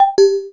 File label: punch; TIMECARD